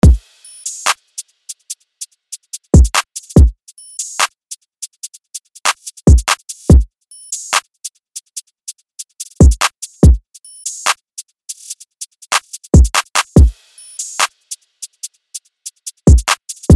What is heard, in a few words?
Drill Drum 144 BPM